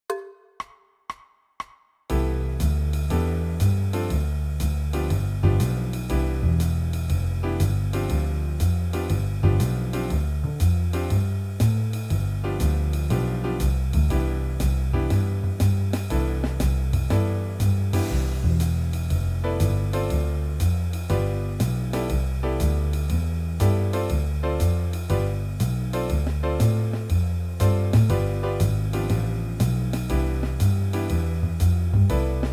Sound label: warm-ups c major